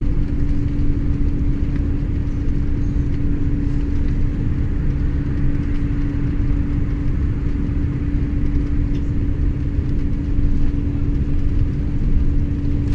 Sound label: Quiet Bus Interior